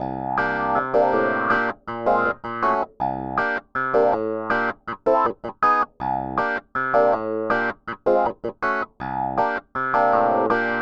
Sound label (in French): basse triades variations, variations chromatiques, funk piano; Triades b795 variations chromatiques 1; Second Line 2 + 3